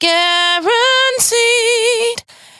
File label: 92bpm Bb, Velvet Vocal; Zero-G